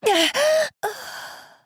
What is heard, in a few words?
Death